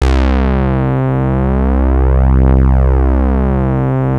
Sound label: HIP delay, HIPHOP; Rubber Chicken Software Sample Bank